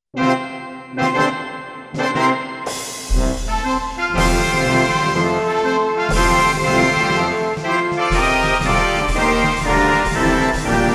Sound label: Glockenspiel March